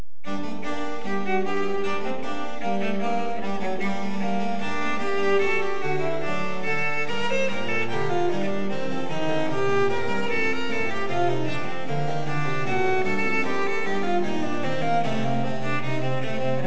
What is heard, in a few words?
Viola da Gamba